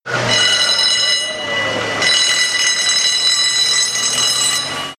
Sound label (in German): Motorkugelwecker